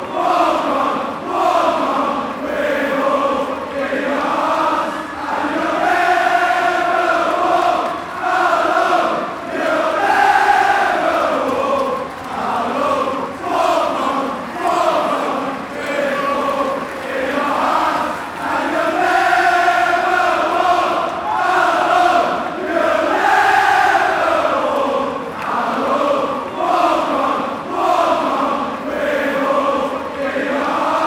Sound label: chorus, fan chants